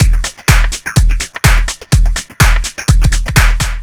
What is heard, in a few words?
Dark Dirty Fill, Drum Loops 125bpm, Nu Dance Electro